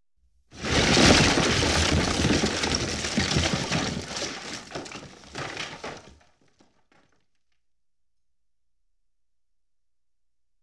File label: Explosion, 5.1 Surround